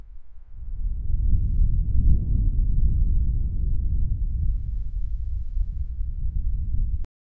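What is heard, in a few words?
strong mountain wind rumble, clouds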